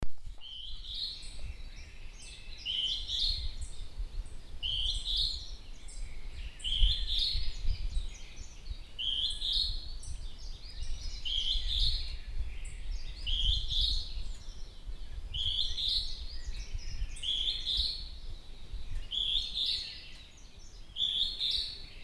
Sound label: hawk-cuckoo rufous Hierococcyx hyperythrus